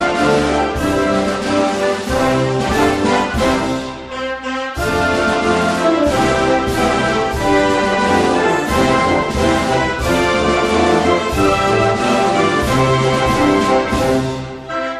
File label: national anthem, ringtone